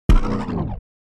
Sci fi energy ball short futuristic

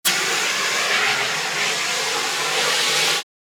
Fire extinguisher spray long

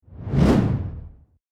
Infographic data chart zoom in

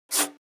Pencil drawing very short stroke

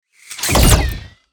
Sci fi heavy gun single blast

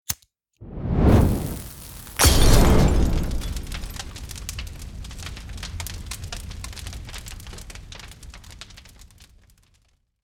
Molotov throw glass break and fire; war

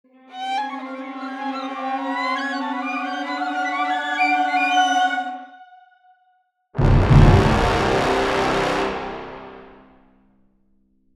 Video game success victory win horror

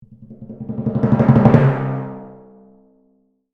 Cartoon timpani drum roll accelerating; drums and percussion

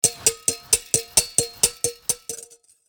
Steel whisk whisking action; cooking

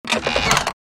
Vampire coffin lid open

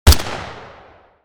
Beretta handgun single gunshot close

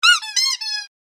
Playful toy squeeze; shorts sound effects

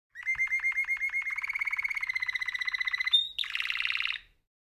Canary bird singing

